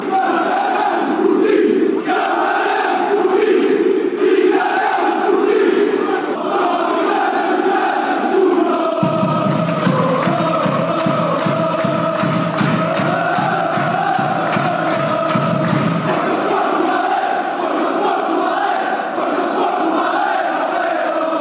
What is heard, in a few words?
go porto allez oh fcp fanchants